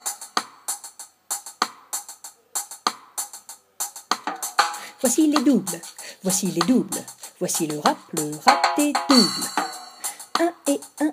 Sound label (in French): rap des doubles; charivari a l ecole